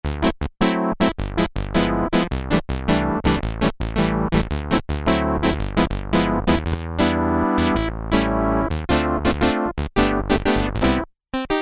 Percussive Synthe, Korg Poly-800

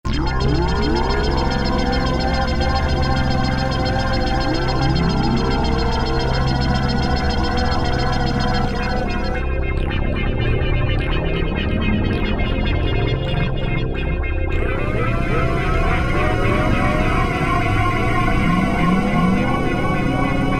Scaaaaary; Alesis Rap Techno Dance

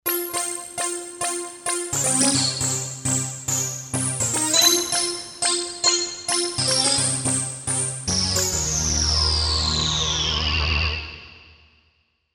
Fifth, Kawai K5000S

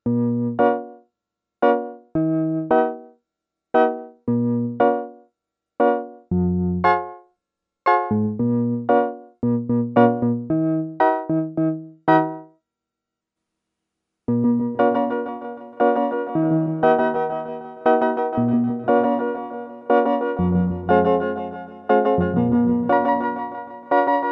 STEREO ECHO

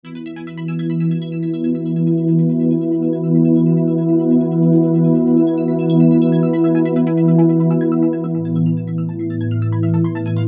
Pad, Korg MS2000; Factory Patches

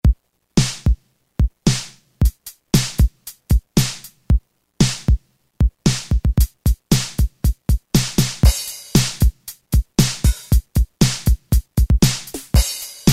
Standard Set, Roland M-DC1, Rhythm Sets

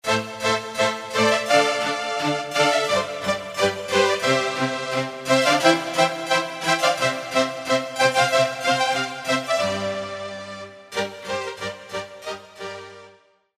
Baroque Strings; Roland PN-D50-01

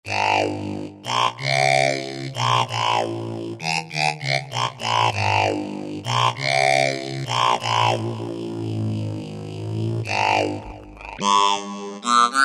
Talk Box; Roland SR-JV80-11 Techno Collection

